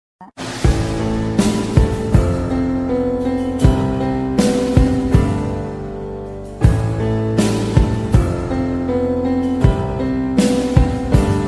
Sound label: love bgm; tamil love bgm